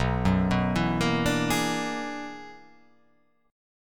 B Minor Major 13th, chord; BmM13; arpeggiated; JGuitar

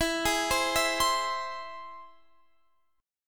E Augmented; arpeggiated; x,19,18,17,17,20

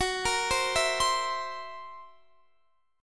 Gb 7th Flat 5th Gb Guitar Standard; arpeggiated; JGuitar

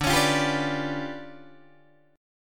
D Minor Major 13th, down, Guitar, DADGAD

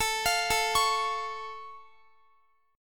A Augmented A down Guitar; A+ arpeggiated

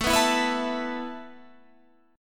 A Suspended 2nd A Guitar Standard; Asus2 strummed

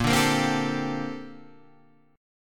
Bb Major 13th Bb; strummed

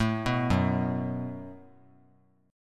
Gb Minor Double Flat 5th; arpeggiated; x,16,14,16; JGuitar

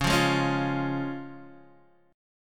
Db Major Db down Guitar Half Step Down

